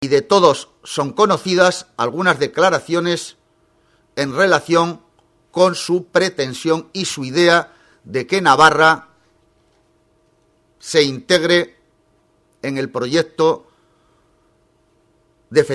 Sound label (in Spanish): organo